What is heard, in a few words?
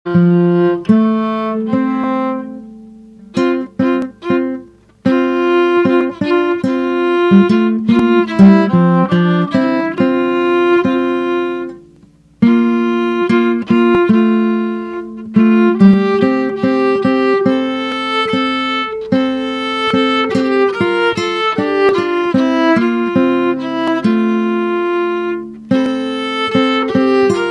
S bow A; Quando ritrovo